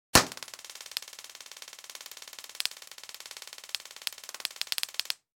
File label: taser; deathmatch; [BVR]Taser